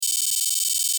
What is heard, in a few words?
Data Readout Digital